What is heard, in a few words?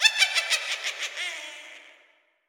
Witch Cackle